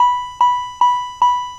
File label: Download Alert